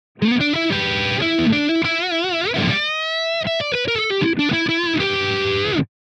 Pasifica neck hum

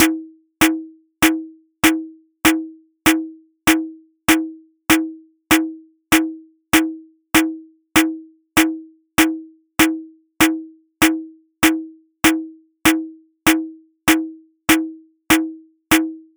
snares cr78 classic wet; egnater; aas objeq delay

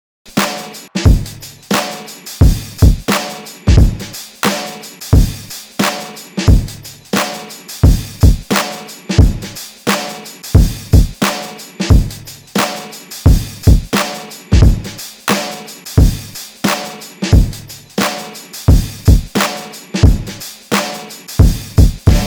new drum

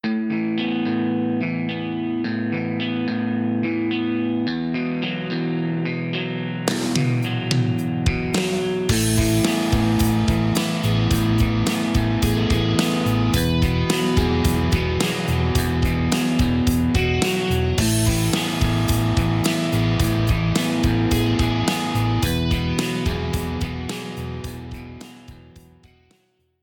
Arpeggio

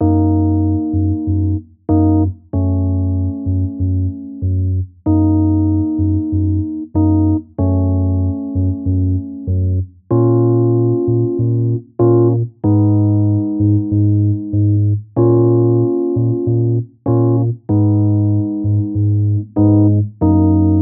plate tremolo dry; aas objeq delay modulators

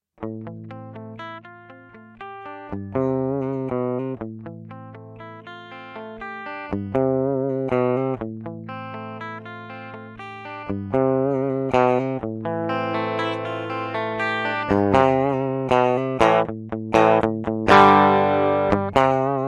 bass overdrive; mxr bassoverdrive

